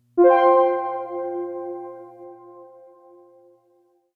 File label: Jingle Chat Startup; Nintendo Wii U System Menu